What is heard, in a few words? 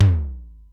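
Simmons SDS-9 low tom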